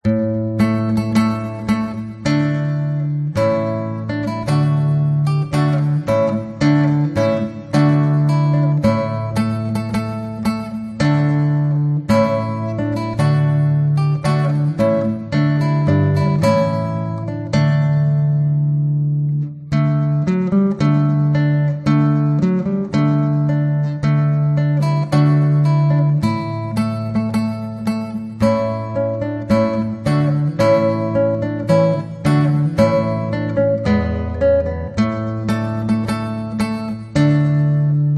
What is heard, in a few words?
trumpet voluntary